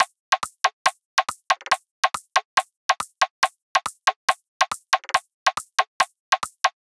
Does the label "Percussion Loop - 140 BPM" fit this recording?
Yes